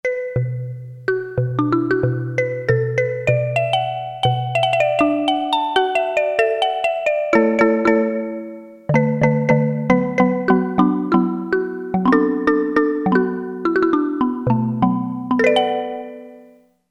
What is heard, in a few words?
XYLO, Roland Jupiter-8; Factory patches